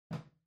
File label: TV Hardware Far RC Put Cushion